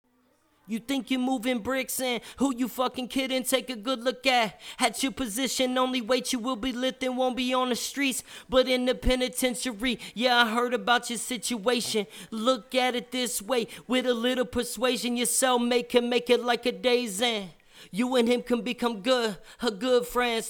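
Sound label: hip hop 165 B flat minor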